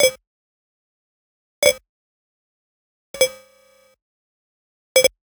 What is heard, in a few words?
Arcade Click Negative